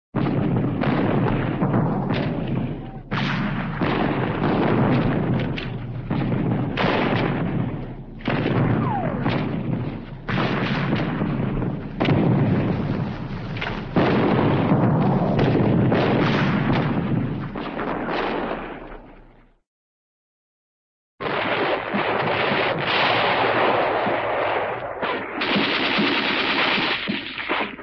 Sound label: battle, military artillery fire, explosions; cartoon